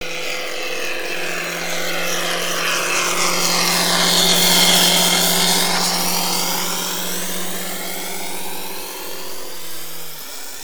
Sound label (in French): bateau qui passe; suez